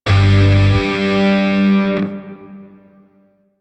Shawzin Yo Chord J; Music; Grineer; DE; Warframe

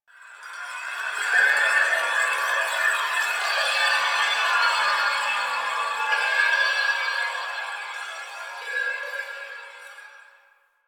Interior Texture, Ambience; Warframe; Quests; TheNewWar; Cetus; Unum Tower

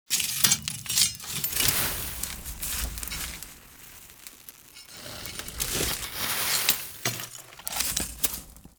Blacksmith Poke Idle; Friendly; Tuvalkane

